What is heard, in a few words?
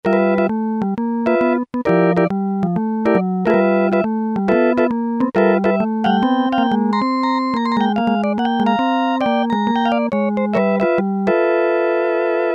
JAZZ ORGAN, Yamaha SHS-10